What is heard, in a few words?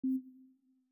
secondary hover